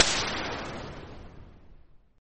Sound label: Gunfire sfxr; gridtracker